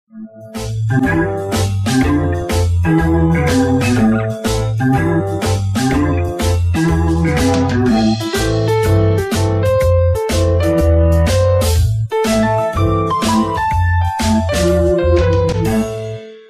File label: sunset groove; Jazz